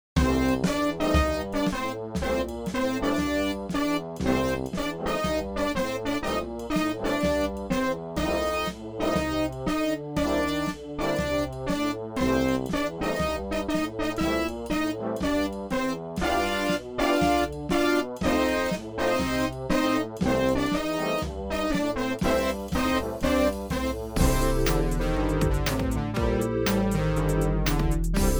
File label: MIDI blues; spellman studio